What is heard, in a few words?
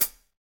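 HH, hi-hat; Sound & Vision - Gigapack I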